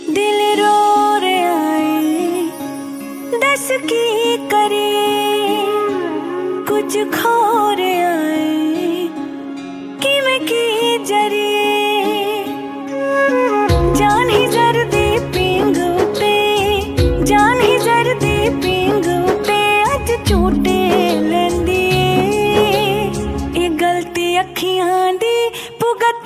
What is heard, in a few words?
Punjabi Sad